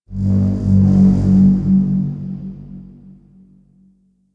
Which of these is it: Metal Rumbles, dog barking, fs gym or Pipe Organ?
Metal Rumbles